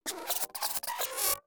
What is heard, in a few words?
Cam-Bot Eye, creatures mechanical